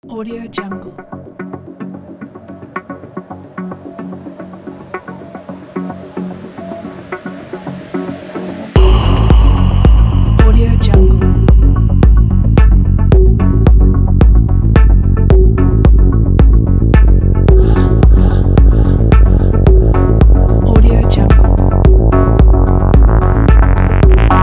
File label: minimal techno